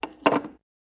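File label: Phone Receiver Pickup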